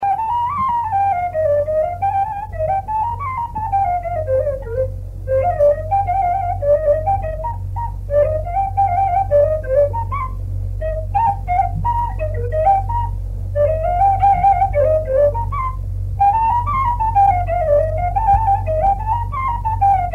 Musamaua la fluier; jocuri si cantece batranesti; Vaslui

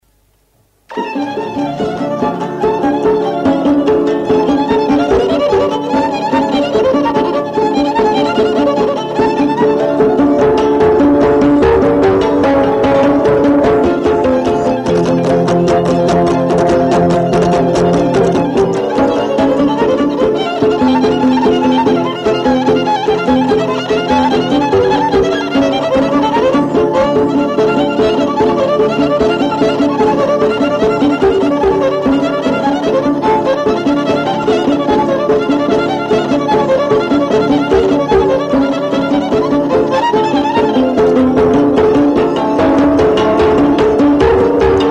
Floricica taraf; jocuri si cantece batranesti; Vaslui

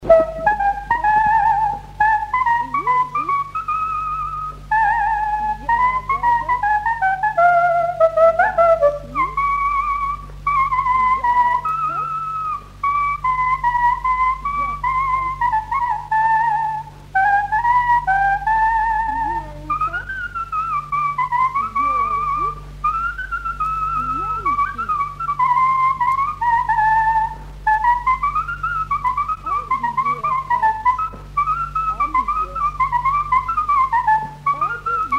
Sarba la frunza; jocuri si cantece batranesti; Vaslui

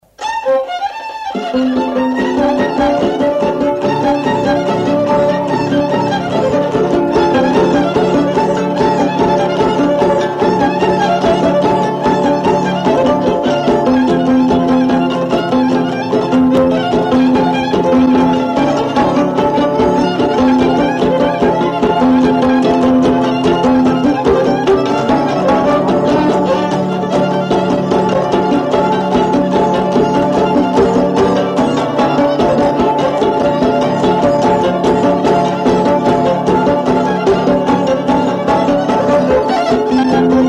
Polca taraf; jocuri si cantece batranesti; Vaslui

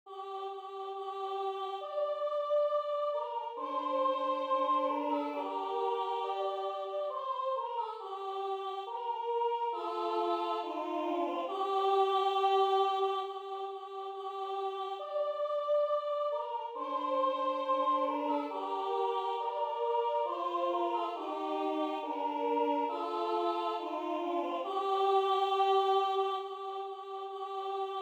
Maria se preumbla, Moldova, colinde

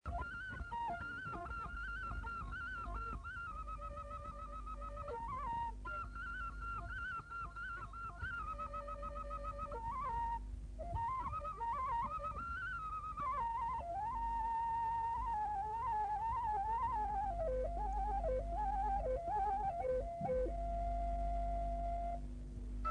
Miorita la fluier; jocuri si cantece batranesti; Vaslui

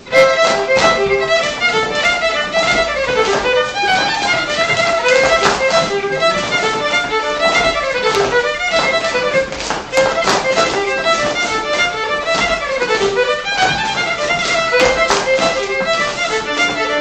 Sarba, joc popular vasluian; de la Fruntiseni; Centrul Judetean pentru Conservarea si Promovarea Culturii Traditionale Vaslui

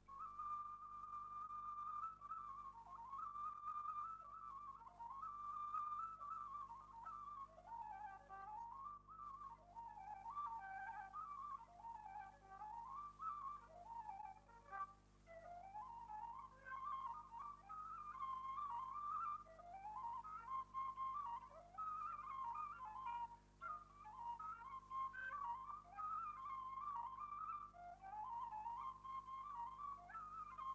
Hora de plai la fluier; jocuri si cantece batranesti; Vaslui

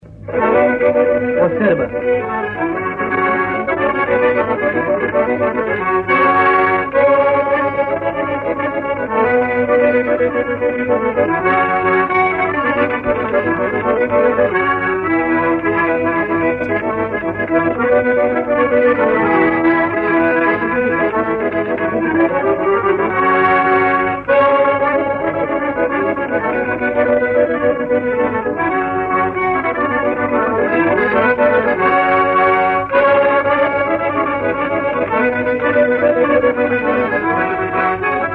Sarba la armonica; jocuri si cantece batranesti; Vaslui

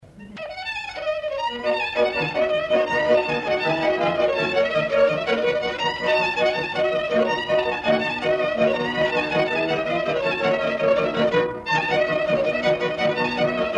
Coragheasca la taraf; jocuri si cantece batranesti; Vaslui

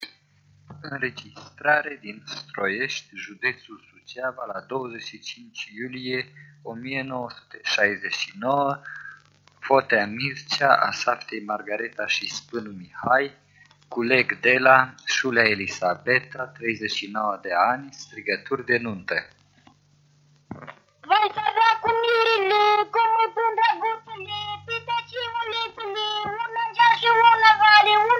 Strigaturi; Vaslui

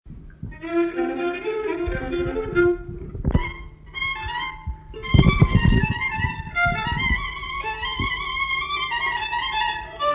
Polobocul la vioara; jocuri si cantece batranesti; Vaslui

